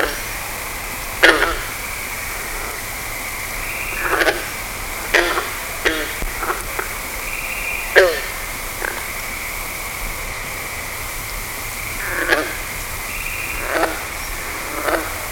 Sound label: green frog; frog calls